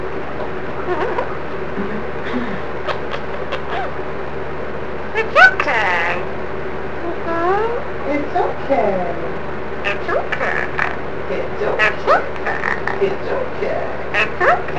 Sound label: it's ok, parrot